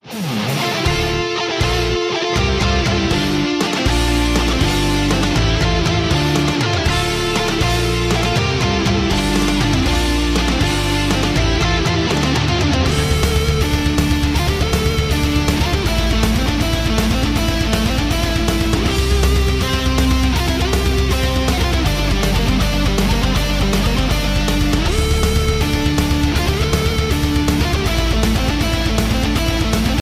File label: Metal Mashup